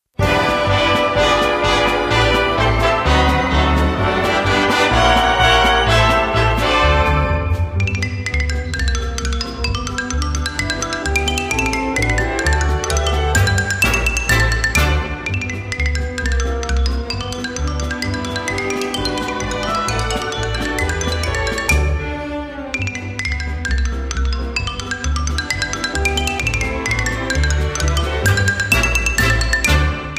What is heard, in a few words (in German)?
Xylophonsolo; Palisander